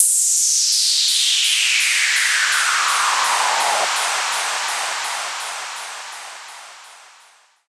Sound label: Noisy, Break Beat